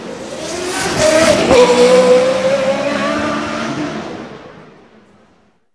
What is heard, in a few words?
RACE CAR; AKAI S-Series CD-ROM Sound Library VOL-6